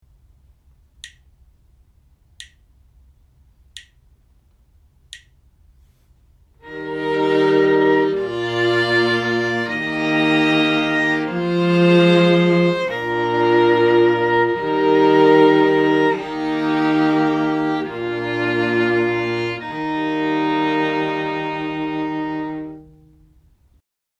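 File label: harmonic dictation, string quartet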